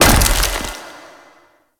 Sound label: Worker Ice Hammer Impact; ambience; props; one offs; Warframe; Venus Open World; Venus Solaris United